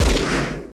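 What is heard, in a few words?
laser gun; Total Control - Action Cutz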